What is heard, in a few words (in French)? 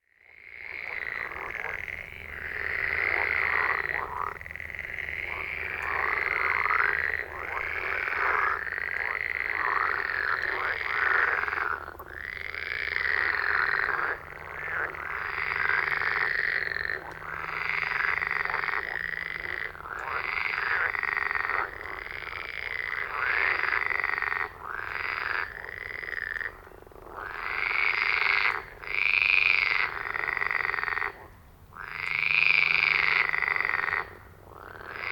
Grenouille verte; bruitage